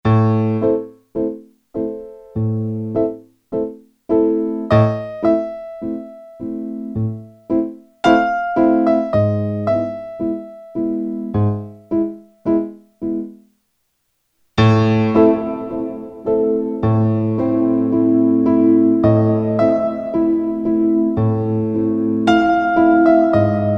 Concert Hall; t.c. electronics M300

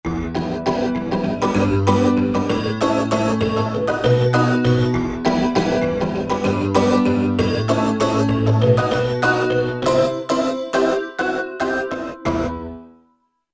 16' KALIMBA, Korg MSC-11S Brass

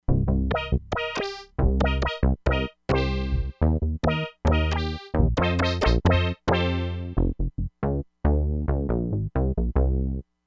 FUNK TOUCH, Crumar Bit 99; Factory Programs

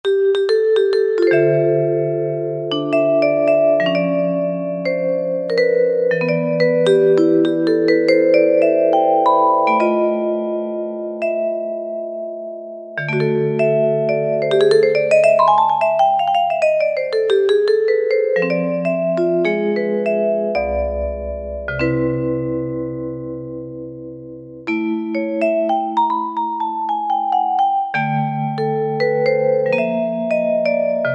Jazz Vibes, Yamaha PSR-3000; Factory Voices; Perc. & Drum Kit

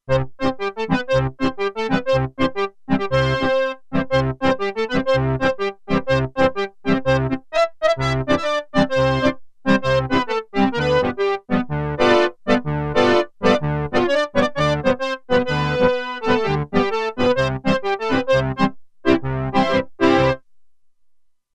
Accordion, Roland Alpha Juno-2; Original Sound Chart patches

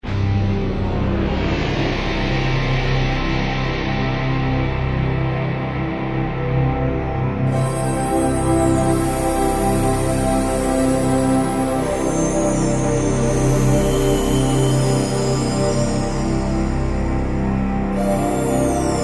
Star Burst, Korg N364; Factory Combi demos

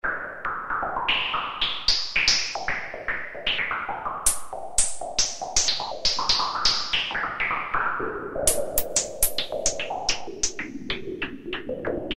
NoiseShots, Roland MKS-50; Factory examples

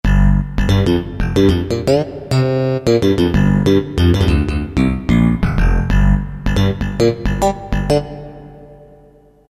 Slap Bass, Roland MT-32

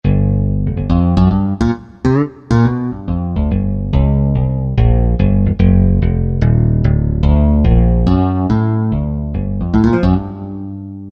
Stick Bass, Korg T3

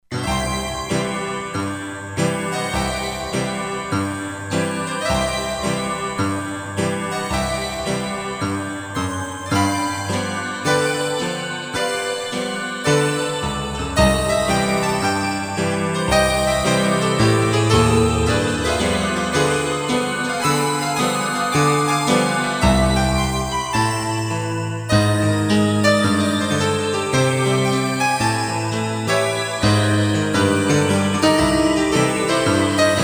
Cool Piano, Korg MSC-16S; Environment

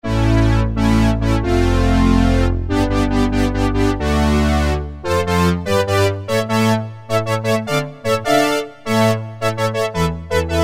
Brass, Roland Alpha Juno-2; Factory B

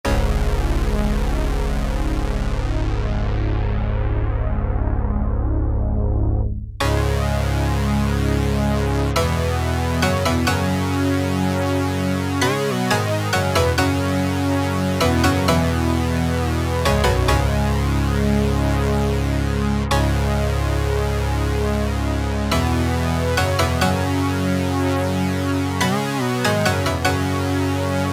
Obese Fifths, Roland MKS-70

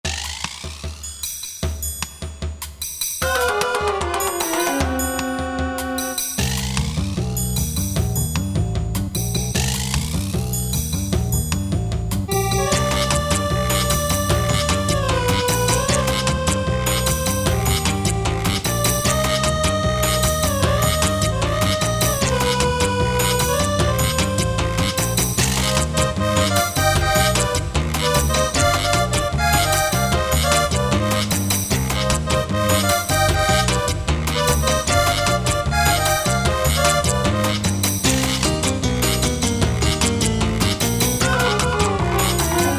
World MUSIC, Korg MSC-8S Percussion; Factory demo songs

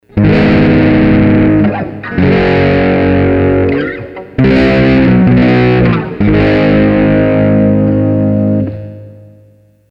Spin Tone DI; Sony HR-GP5

